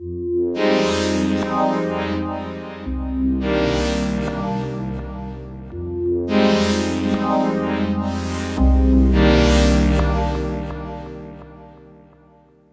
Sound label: synth, string n piano DnB song